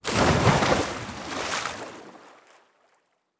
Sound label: Water Splash; Survival Game Sounds - Lite Edition; Environment